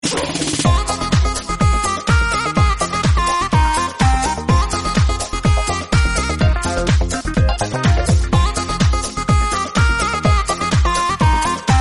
Hindi Remix

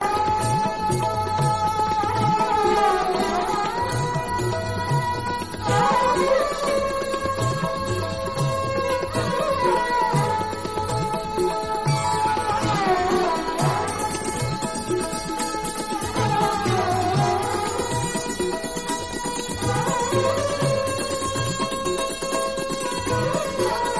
Hindi classical

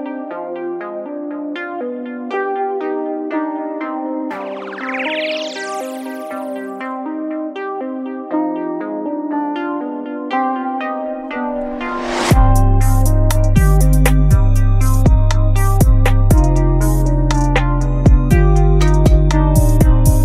LG G Pad 5 10.1 Ringtone

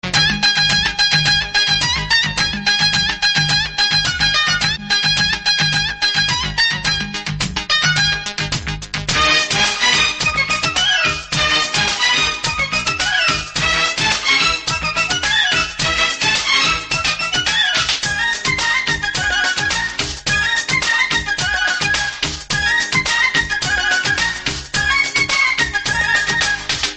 Hindi bollywood Ringtone

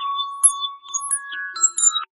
Notification Ringtone; Vivo Y20s

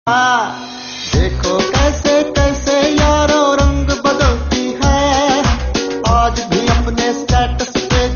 Hindi Ringtone; Facebook

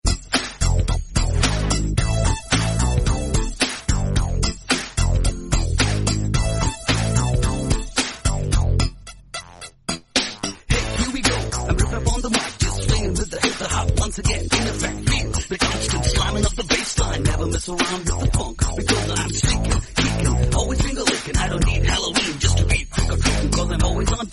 Hindi Ringtone

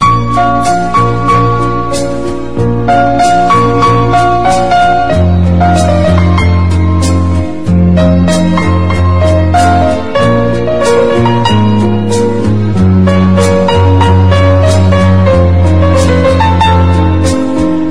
Romantic Ringtone

